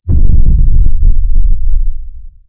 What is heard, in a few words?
cannons sub; guns; tanks; War Thunder